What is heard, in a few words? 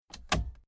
Car Classic Bentley Eight Dashboard Automatic Gear Lever One Click; Slowly; Tom Hutchings